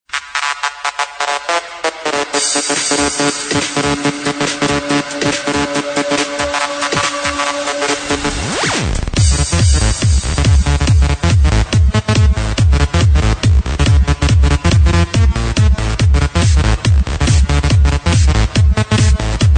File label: Remix Bass Ringtone